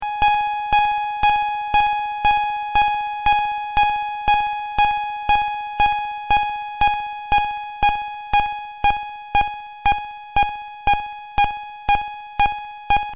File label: envelope; milkcrate